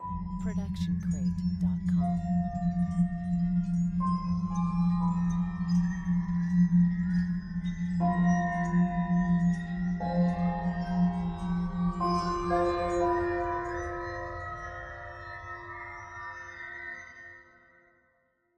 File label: ghost bells; soundscrate